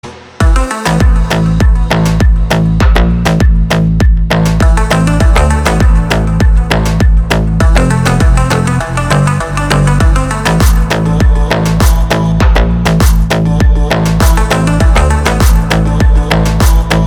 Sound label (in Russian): Мелодия звонка